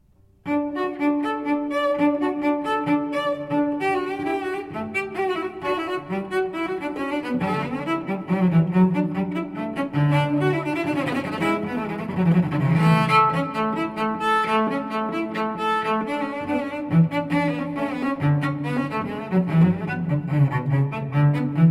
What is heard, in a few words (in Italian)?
vivace, sonata per cello solo in re magg; TWV 40,1; GP Telemann